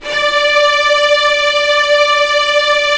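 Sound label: string section; Roland - LA Composer vol. 1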